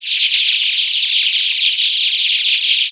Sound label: bracken